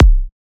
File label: Kick C, psy kick; Drums; Ghosthack; Ghosthack Advent Calendar 2017 Day 9 - Psy Kicks; Ghosthack 20 Free Psy Kick